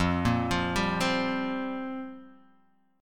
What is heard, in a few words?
F Suspended 2nd Suspended 4th, Guitar, DADGAD; 3,1,3,0,3,x